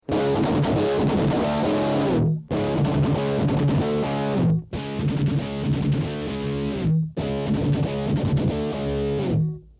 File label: Hot Line dirty